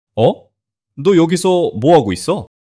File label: surprise